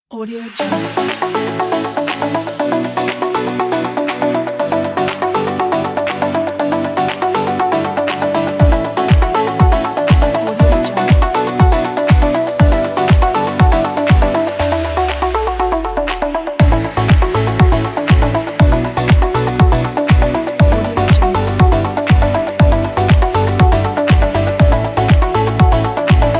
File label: summer upbeat pop; lastsynth